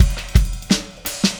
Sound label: Static Beat Fill B; Sonic Foundry (Sony Creative Software); Indie Rock Anthems; Session 13 - Static